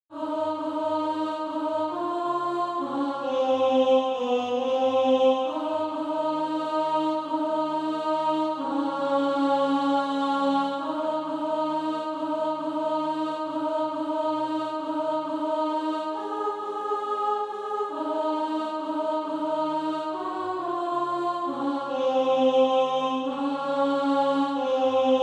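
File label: alto, Practise Music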